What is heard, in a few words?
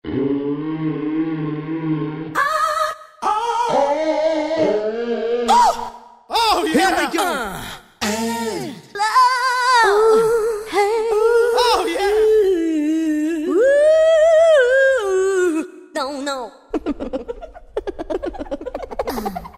Vox Menu, Roland SR-JV80-06 Dance